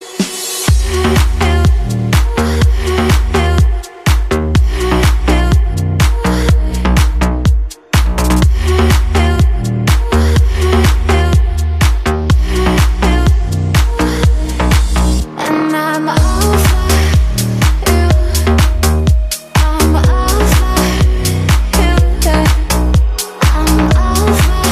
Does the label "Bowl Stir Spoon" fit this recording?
No